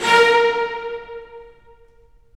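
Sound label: STR, strings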